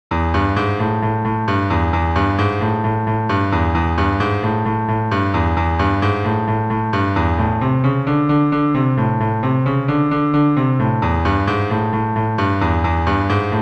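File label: piano, left hand only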